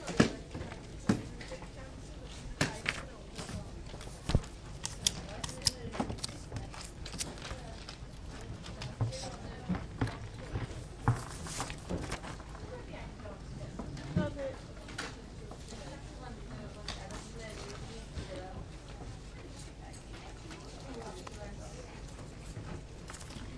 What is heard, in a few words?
work noises